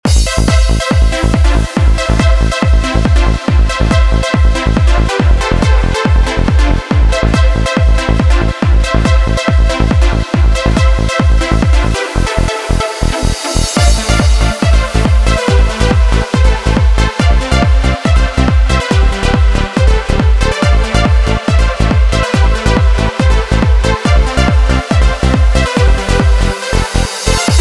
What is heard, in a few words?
Catchy Melodies, trance music; melody writing tutorial